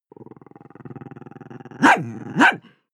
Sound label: chihuahua growl bark; natur-tiere; hunde